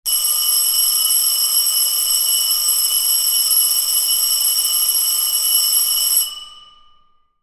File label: School bell sound effect